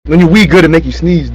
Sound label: sneeze